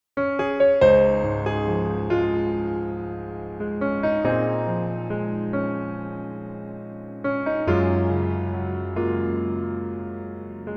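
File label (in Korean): Piano version -1키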